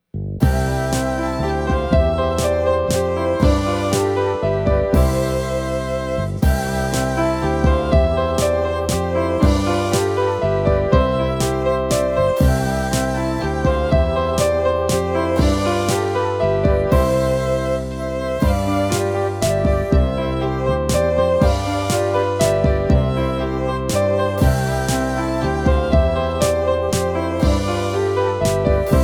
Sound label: Its Buddy Time Instrumental